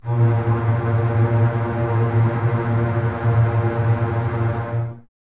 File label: A#2, Basses Tremolo, String Ensembles; Miroslav Vitous; 90 s Sample CDs